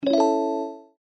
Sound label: got free bomb